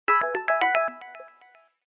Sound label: A toy watch